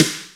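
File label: R8-SD, snare drum; X-Static Goldmine 1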